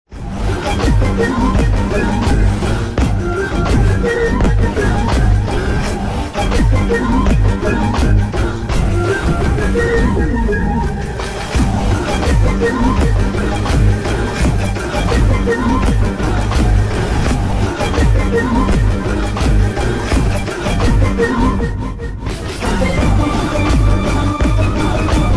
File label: bgm ringtone; jailer